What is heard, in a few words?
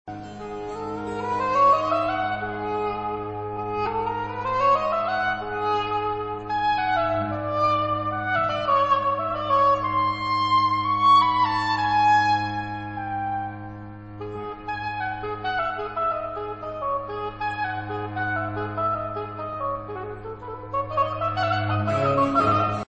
american baroque; MagnaLoops